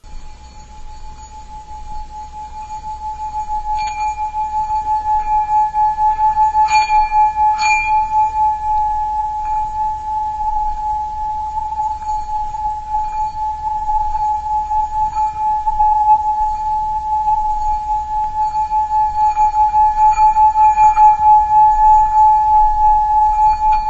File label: high pitch; Rumbles